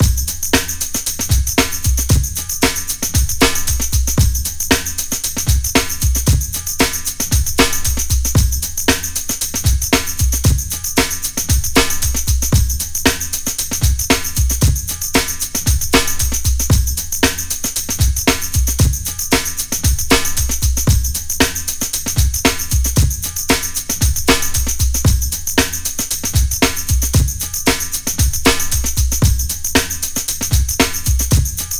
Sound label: 115 Smack Tamb, Breaks; Lockdown Breaks